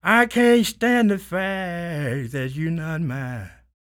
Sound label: SING, Blues Soul; Spectrasonics Vocal Planet